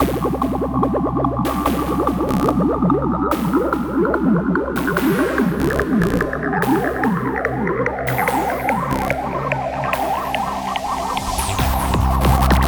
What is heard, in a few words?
Psytrance GOA; Trance World